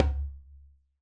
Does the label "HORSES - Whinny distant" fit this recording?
No